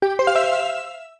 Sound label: Main Menu Select; Make a selection on the main menu (Recorded)